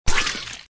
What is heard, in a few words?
Sfx Enemy Pig Dead